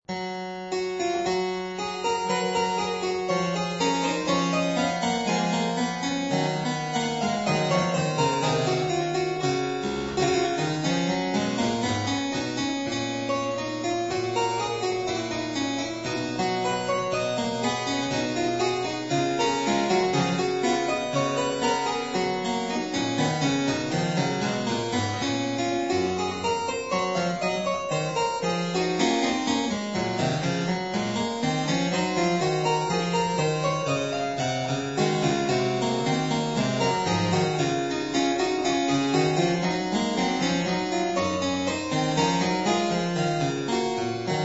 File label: Variatio 26 a 2 Clav.